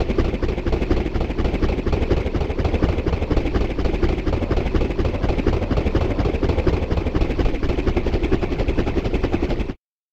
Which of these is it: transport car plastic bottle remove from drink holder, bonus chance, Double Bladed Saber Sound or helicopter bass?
helicopter bass